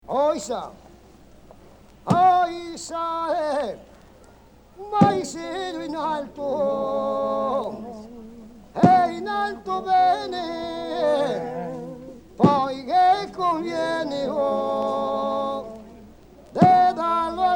pile drivers' song; canto dei batti-pali

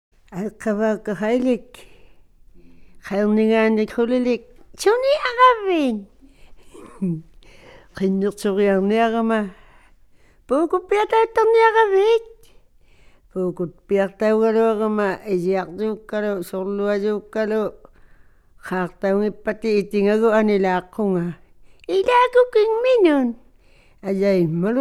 Head Lice Song; INUIT

